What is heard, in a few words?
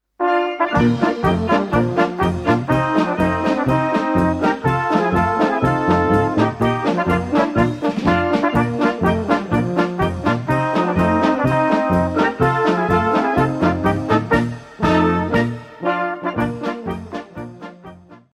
Polka